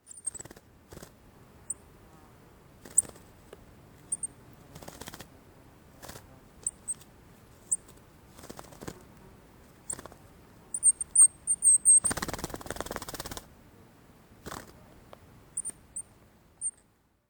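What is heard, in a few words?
Eurasian Treecreeper, Chichibu; kibasiri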